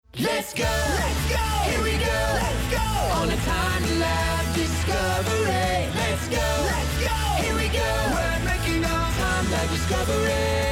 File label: theme music ending; time-lab